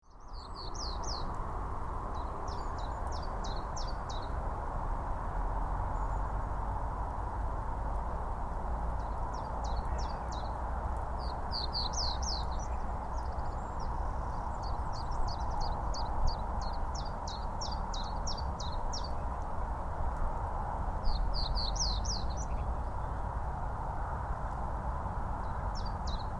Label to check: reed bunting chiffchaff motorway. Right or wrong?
Right